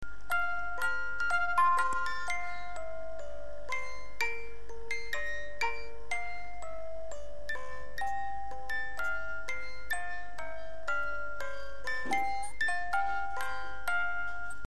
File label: zap